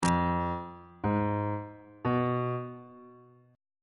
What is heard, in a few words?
c flat lydian chord 4 is f diminished on bass clef